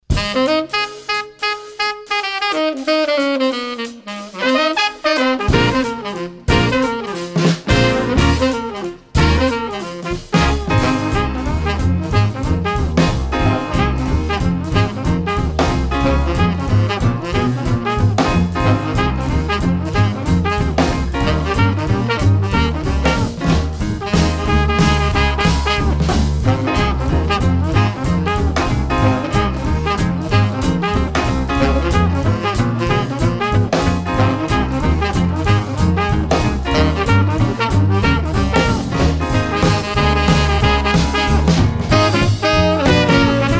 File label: Jazz Band